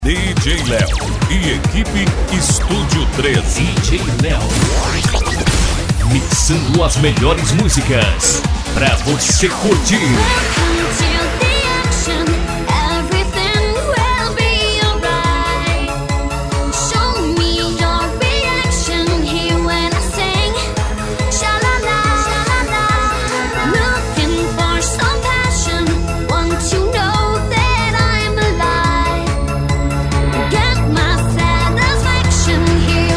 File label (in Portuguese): dance sertanejo remix eletro funk e pancadao; Studio 13